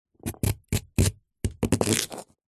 sweet potato raw slice cut then split open with large knife; zapsplat